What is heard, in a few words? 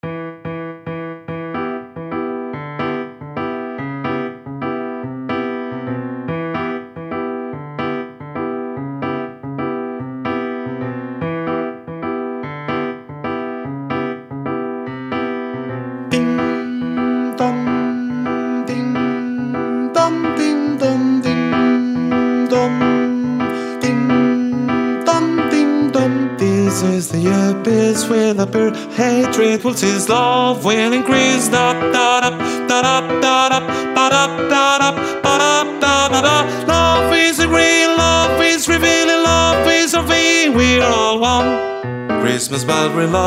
TENOR; ADIOSES SHOW CHOIR; Navidad 24; CAROL OF THE BELLS